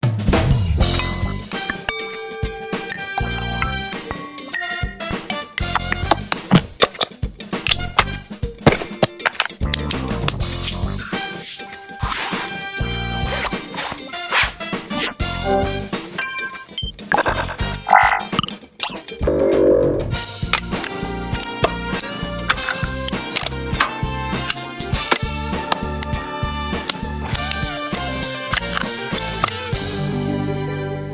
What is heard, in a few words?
Interface; Boom Library; The Interface